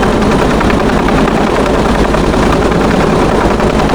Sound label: huey hovering; ambience; Soldier Of Fortune II Double Helix; XBOX